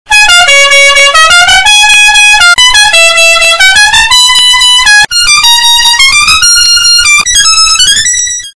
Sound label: goofy car horn but it gets worse